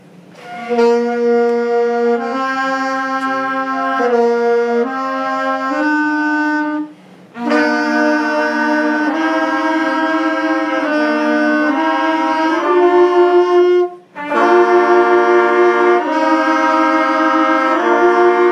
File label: warmup, band